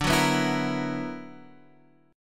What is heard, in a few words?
B-Minor Major 11th